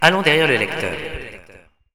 kit; Villagina; Village; ECOLE

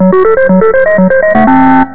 tune; insectoids2

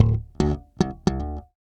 bass loop, Rock; ACID MUSIC

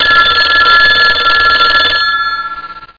Local Ring; PhonepakV2

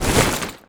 impact

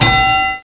GOOD bell

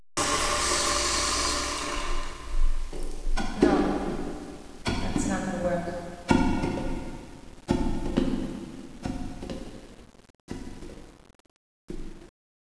SEWER

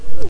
menu swipe

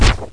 flare; TERACIDE

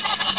aliens

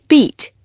beat

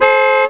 exclamation; Harley Davidson (90th Anniversary)

